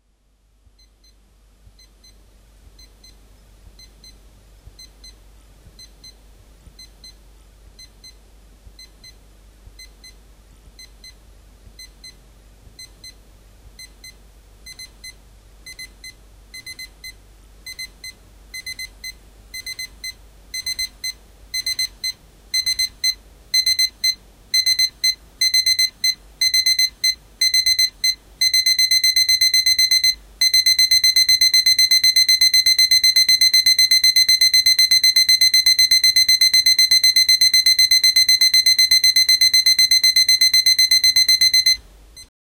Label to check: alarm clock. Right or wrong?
Right